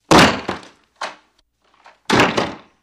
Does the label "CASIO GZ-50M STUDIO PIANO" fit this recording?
No